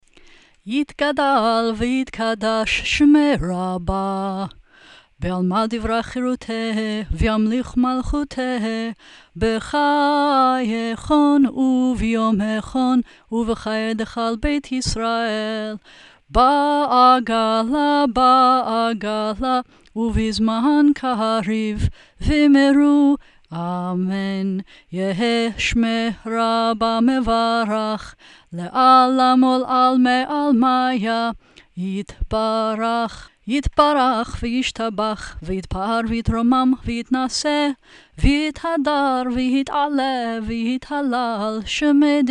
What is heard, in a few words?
Hatzi Kaddish sung; Bnai Mitzvah Requirements; Service Practice